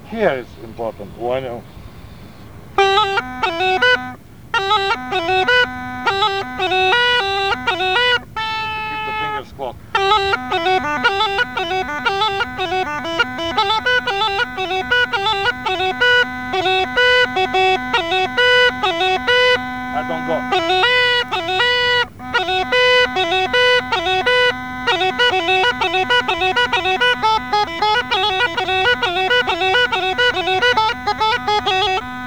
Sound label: Ornaments slowed down, Rodope Gajada; Lesson Recordings; Vasil - Rodope Gajada Lessons